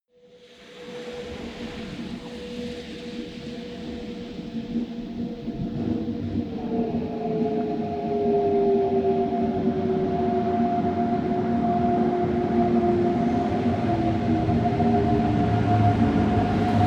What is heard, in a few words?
train locomotive stop